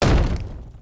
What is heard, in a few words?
Cave In Rock Hit